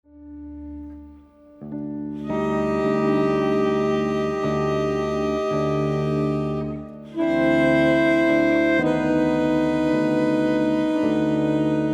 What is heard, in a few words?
chime